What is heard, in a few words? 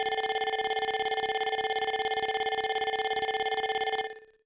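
Alarm Call DX100 D#6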